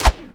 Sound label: Weapon Arrow Shot; AdobeCC